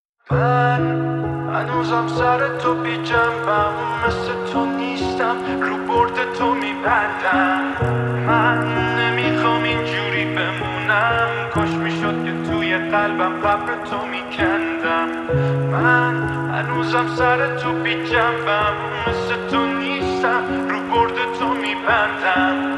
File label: Piano Version